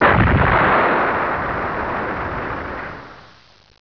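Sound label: EXPLOSION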